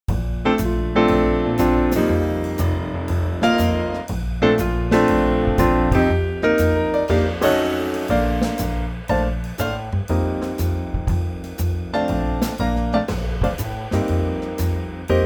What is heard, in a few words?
Bb Trio